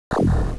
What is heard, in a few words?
teleporter Active; NETSTORM